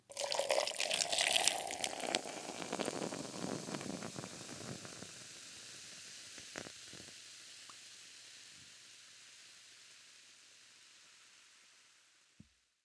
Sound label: pouring; Golden Crayon Sounds